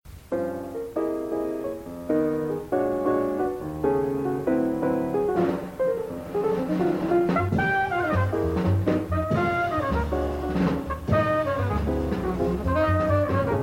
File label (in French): musique